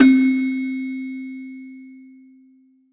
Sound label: Roland JV-2080 Celesta C4